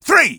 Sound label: Sniper taunt exert; rps